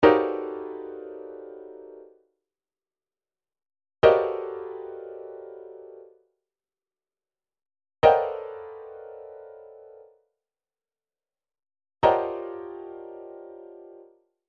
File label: chord lines Eb, midi; Midi Files 1000; Expert Eb